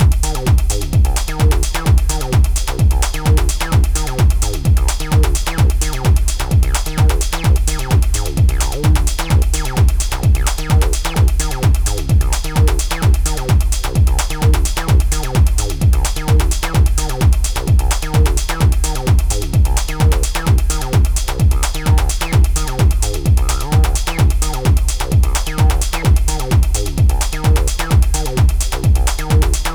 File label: TB 303 Squeal DRUM